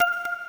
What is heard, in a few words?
crystal scatter; Lucky Fortune; Wazdan